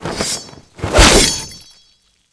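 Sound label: Wind Lance skill; Seer; GamigoNA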